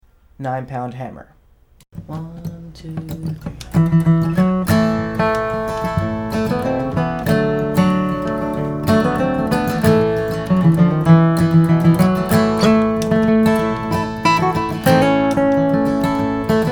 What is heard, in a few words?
Nine Pound Hammer